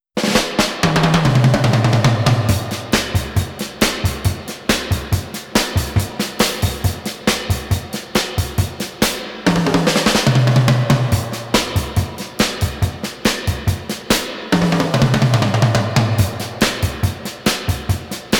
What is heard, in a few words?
drums